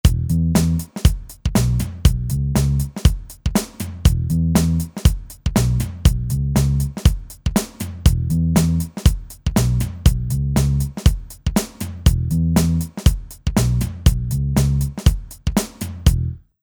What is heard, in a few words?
Rock